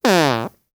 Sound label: Short Sturdy Fart